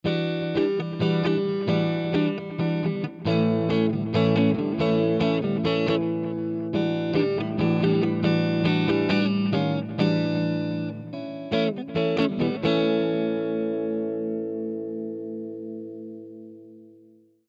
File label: iRig HD 2 Clean; IK Multimedia